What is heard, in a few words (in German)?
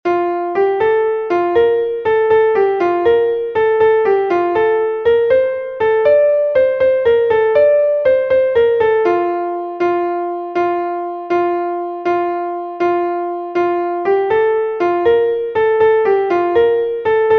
klavier, kanon; o wie wohl ist mir am abend